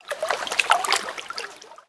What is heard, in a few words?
lake; Level2 Ice Fields